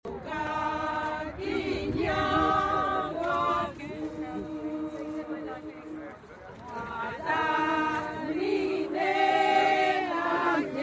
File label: Kenyan Ladies choir; Amazing Grace